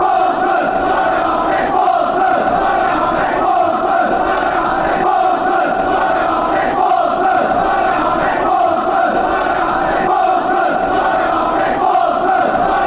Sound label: barmy army, fanchants; walter smiths